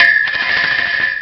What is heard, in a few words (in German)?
eisenstange; ST-XX Amiga Soundtracker Sample Packs